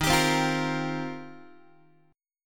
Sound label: D sharp 6th Add 9th, Guitar; strummed; D#6add9; JGuitar